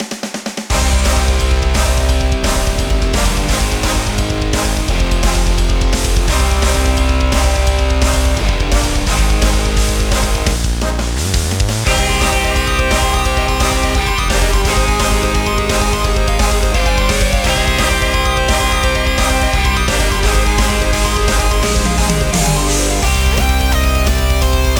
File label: Despair Mode Metal; Golden Krone Hotel